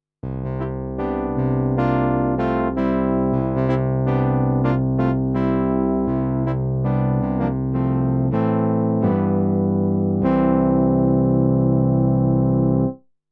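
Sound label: K150 synth horn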